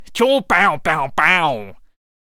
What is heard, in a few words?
Gunslinger Attack Vocals